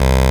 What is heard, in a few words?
SYN OBX-A, SYN Analog; Sound & Vision; Gigapack I CD 2